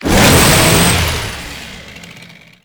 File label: Saw Melee Swing; weapons; Warframe; Grn Ghoul Saw Player; Kuva Ghoul Saw